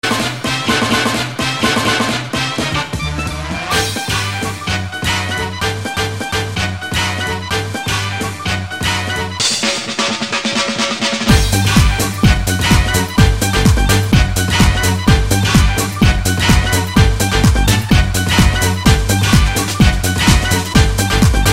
dance hit

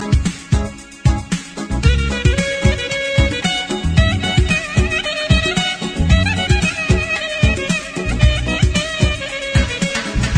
armenian clarinet

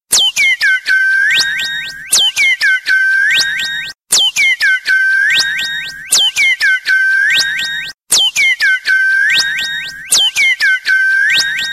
nokia alien tone

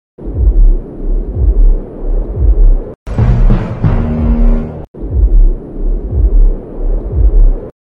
thriller n suspense

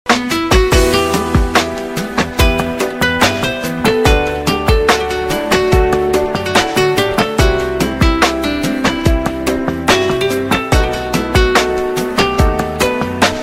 romanian guitar

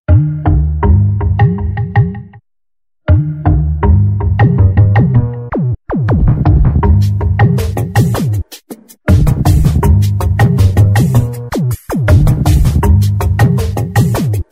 play ringtone

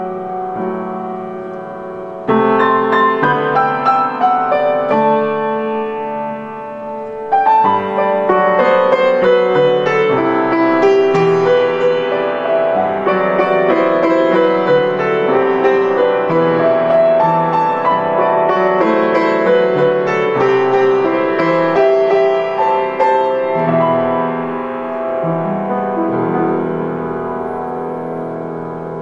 lullaby

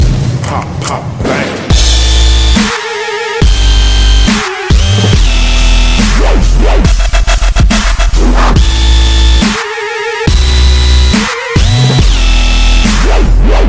russian lullaby

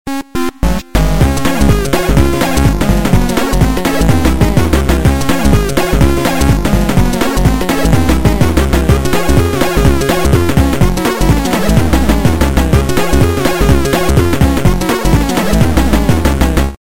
android 8bit

